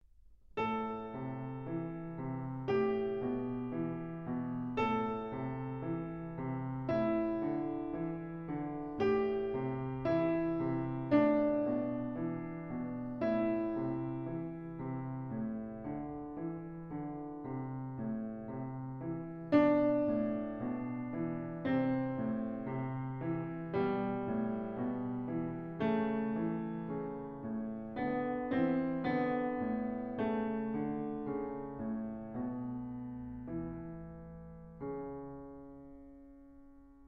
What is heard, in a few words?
Acompaniment in Broken Triads, Piano; Book 2; Mikrokosmos, Sz. 107; Bartók; Complete Solo Piano Works